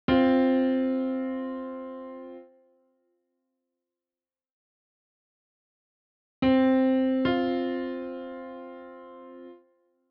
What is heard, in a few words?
interval